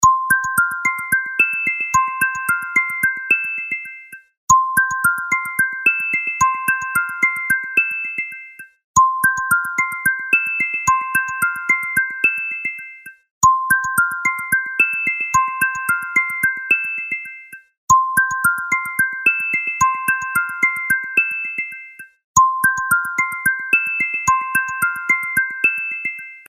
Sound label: soft wake